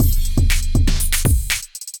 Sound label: Electrified Kit; Electrified Kits